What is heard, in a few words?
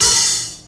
muted open high hat sound g key